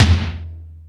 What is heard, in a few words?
Lazer Drums, drum machine